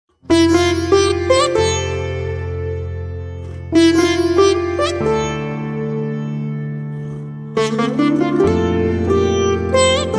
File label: instrumental ringtone nostalgia ringtone; rishabh music